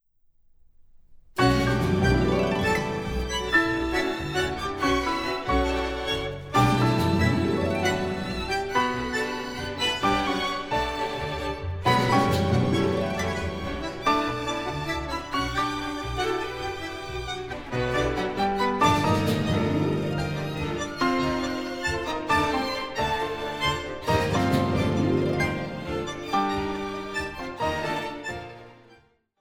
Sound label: Bandoneon Concerto Allegro marcato